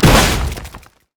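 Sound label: minefield explosion; LoL; ziggs snowday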